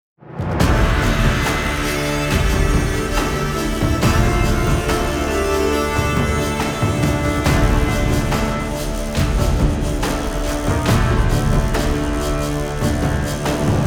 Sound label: Combat; The Witcher 3 Wild Hunt; Q501 Combat With Canaris